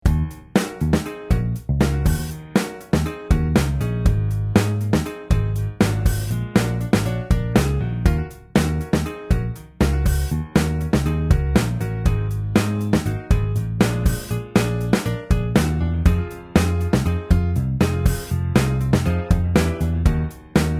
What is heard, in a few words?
Funky Rhythm